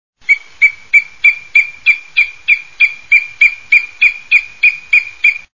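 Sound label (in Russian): orel-karlik, booted eagle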